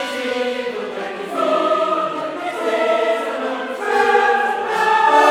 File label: Chorus & Alto Solo; INTERLUDIUM - Scena